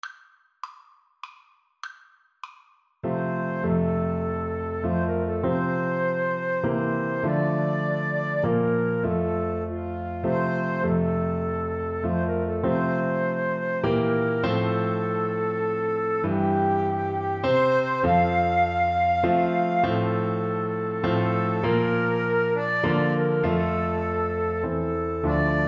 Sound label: Flute duet